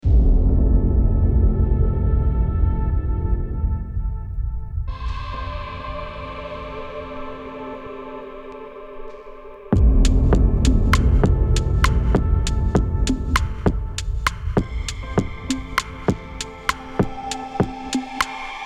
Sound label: slow motion fashion ambient